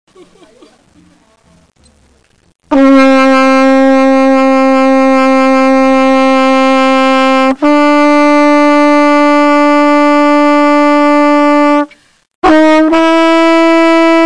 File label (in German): Trompete, Tonleiter